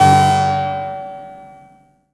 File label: HOE DOWN, SYNTH; Keith Emerson Signature Series